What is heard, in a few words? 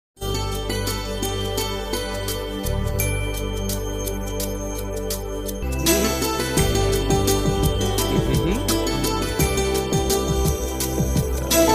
odia movie love romantic bgm ringtone; tu mo love story 2; swaraj; bhoomika; sidhant